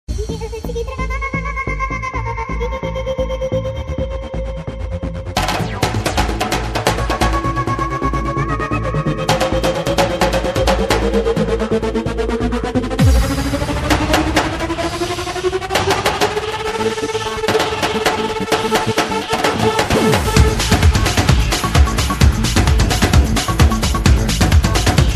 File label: powerful ring ringtone